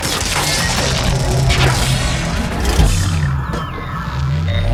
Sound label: charge